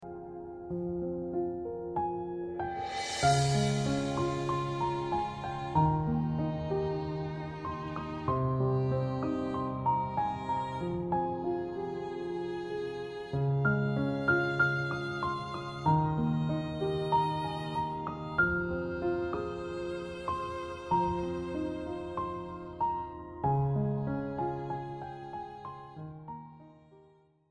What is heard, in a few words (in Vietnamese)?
Nhac piano ban dem dep va song dong hay cho radio